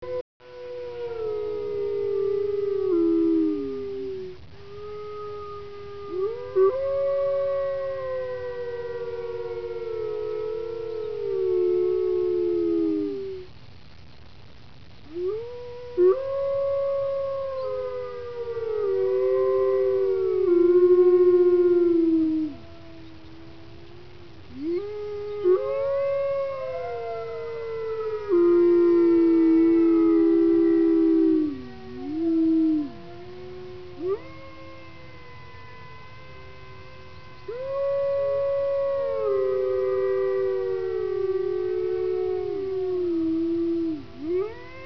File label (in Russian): Zvuki prirody Voy Volka